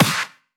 Claps & Snares

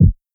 Kick; Street Anthems Sound Kit

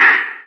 Percussion; Vengeance Samples; VEH2

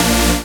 Synths A#; Vengeance Samples

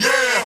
Chant

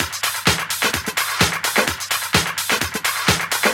Minimal Loops

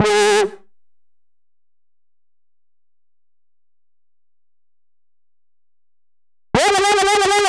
Crazy Chip Melody 3, 128BPM; Vengeance Samples

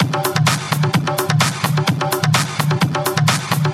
Latin House Loop 128 BPM; Vengeance Samples

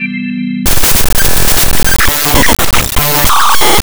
piano loops 125; PS-28 Vocal House Samples

